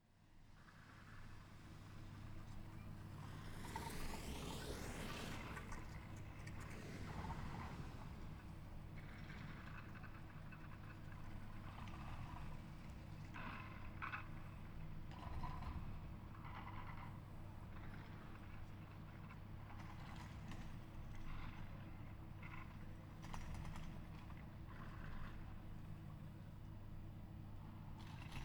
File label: ski resort lift far